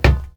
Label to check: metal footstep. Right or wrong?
Right